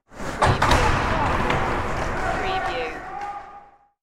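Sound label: Hockey Hit Glass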